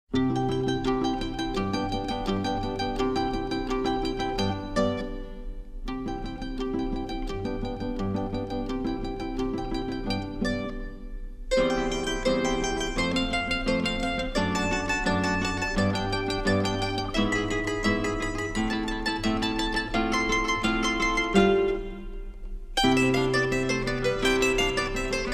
Concerto For Mandolin, Strings & Harpsichord In C Major - Allegro